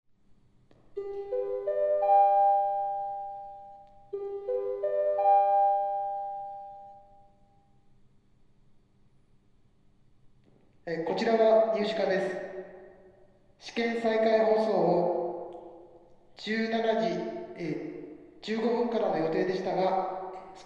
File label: jalert drill; 行政政策学類棟; 予行演習は，大事だ．; fukudai